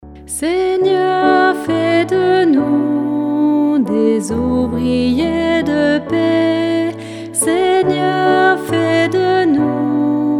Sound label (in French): Assemblee Alto; Seigneur Fais De Nous Des Ouvriers De Paix